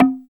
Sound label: TEK PERC; TEK PERC1; Drums In Your FACE!